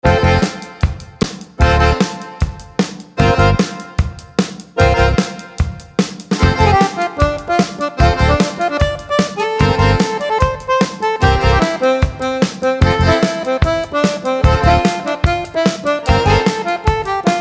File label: Jingle